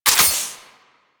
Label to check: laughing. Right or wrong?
Wrong